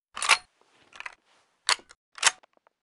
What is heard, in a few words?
assault rifle reload